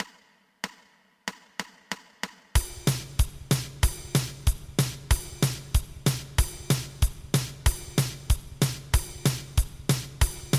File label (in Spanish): 2 pulsos